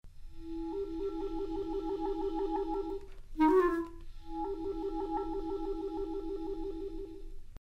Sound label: Clarinet